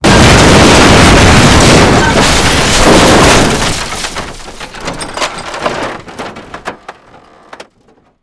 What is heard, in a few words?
car crush; bog; Call of Duty Modern Warfare